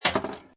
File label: Pinball Sound Metal Clank With Vibrations; Video Games